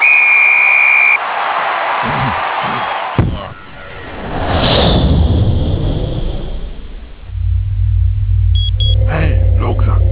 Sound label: space ball; Alcatel; OT-C55x-PC-Suite; Content Library 3